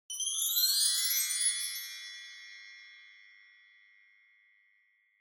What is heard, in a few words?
Wind Chimes Up Low